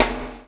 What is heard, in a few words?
CHAIN; Spectrasonics - Backbeat